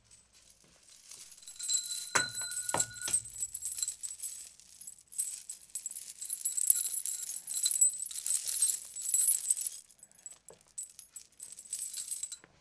Chain

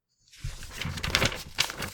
Flip

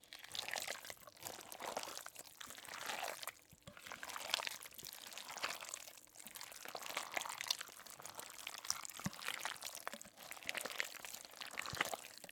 Alien Is Crawling

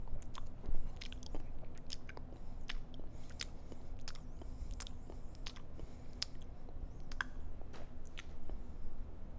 Clearing Mouth

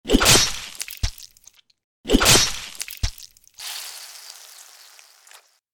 Decapitation

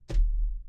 Paper On A Table

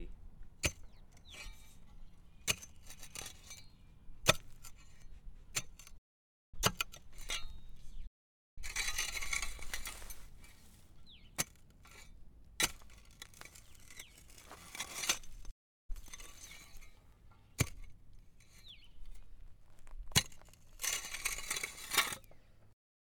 Pick Digging Sound

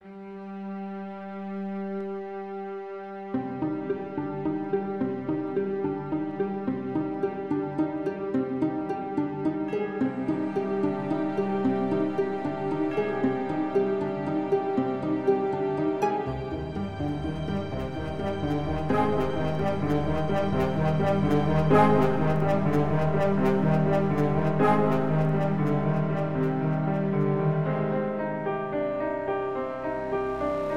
Arc Of Suspense

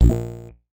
PPG Digital Shorty C1

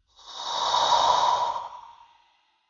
Alien Breath

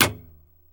Tv Hit Comp

